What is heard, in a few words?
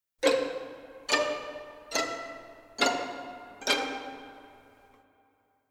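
Strings col legno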